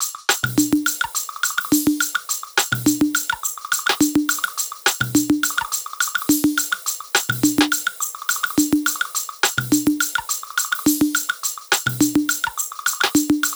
105 drum loop groove top loop; cedar

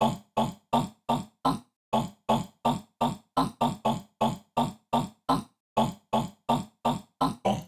Vocal Loop UK Play 125 Dm

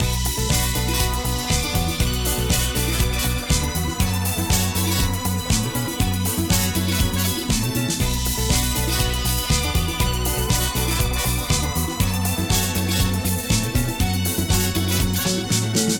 120 A Kit Full Mix

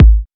Kick

